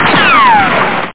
RICOCHET

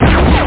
EN SHOOT; ASSAULT; PC Gamer 46

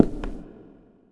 foot glass; Earth and Beyond; EA Games

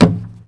BALL WAL 3; HB6DEMO

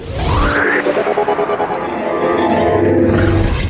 Empty Recycle Bin

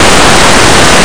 duffle

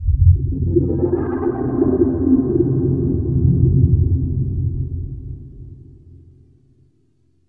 AMBNT 160; Ambient

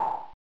pop; AMOS Music

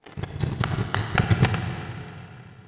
floor breaking; Hellboy

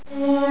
hand glow; landslor

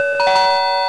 BELLS

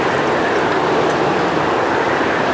bird Looping wind; utdemo; AmbOutside

